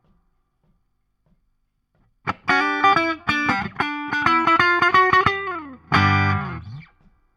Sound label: Guit Solo Back